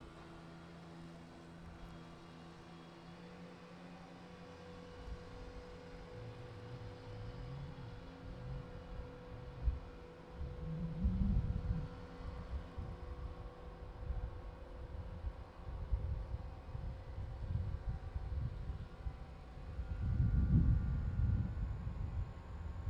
Transformer VS Lawn Mower; Carnegie

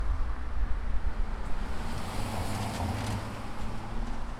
A loud sound; fieldrecordings

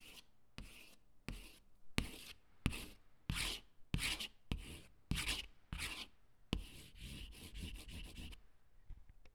Scraping Box With Cord; West Hall Tech Dump

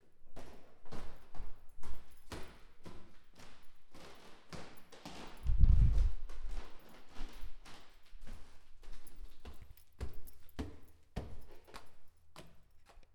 Walking Wooden Floor; West Hall

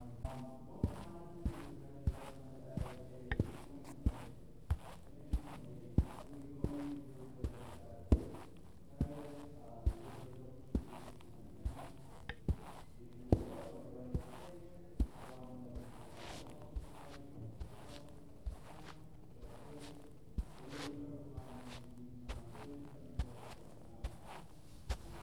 walk indoor

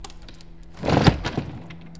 Closing Window; fieldrecordings

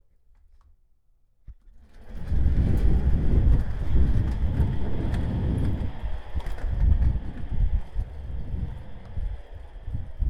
Swivel Chair down tunnel

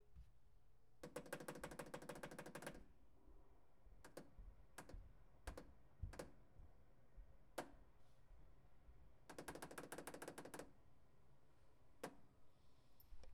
Quicker Tapping Microwave; West Hall Tech Dump

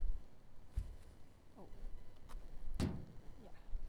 kicking dumpster

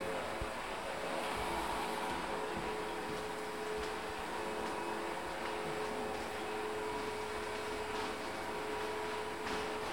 vacuum; fieldrecordings

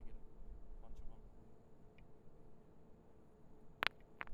Rock On Concrete; fieldrecordings

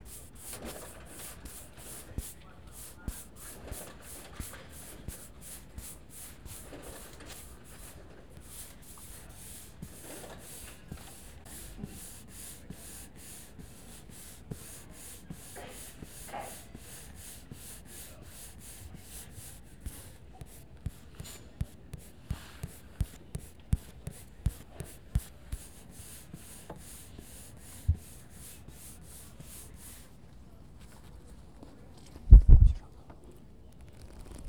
page turning: friction:book

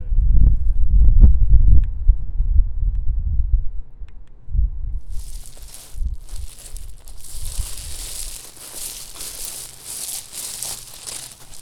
Rock Slide; fieldrecordings

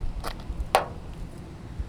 quiet bouncy ball; fieldrecordings

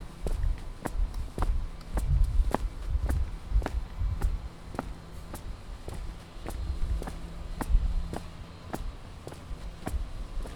Walking; fieldrecordings

